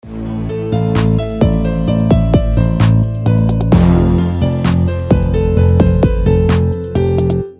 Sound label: samsung a31 ringtone